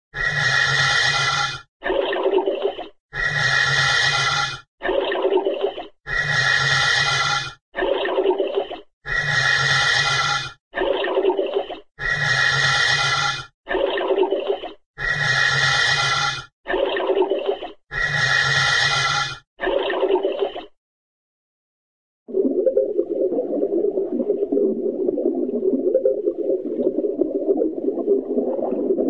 water, underwater scuba breathing underwater; cartoon

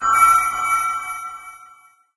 amb rand abstract hi; video games; ambient; random abstract; source engine; portal2

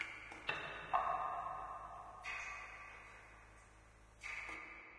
ambient water drips; source engine; portal2

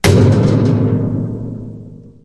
metal grate impact hard; Source Engine; video game; physics

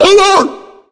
charger warn; player; voice; source engine; left 4 dead 2

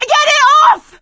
scream while pounced, survivor voice; source engine; left 4 dead 2; producer

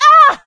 hurt minor, survivor, voice; source engine; left 4 dead 2; producer

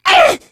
pain; Left 4 Dead 2; Source Engine; video games; jockey

